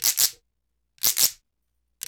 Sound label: BRKDR, Reggae; Spectrasonics; Supreme Beats; World Dance